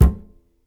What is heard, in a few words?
Upright Acoustic Bass